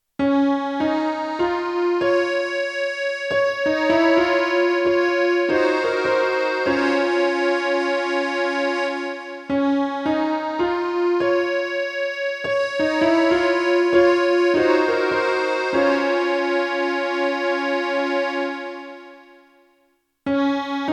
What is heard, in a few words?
monophonic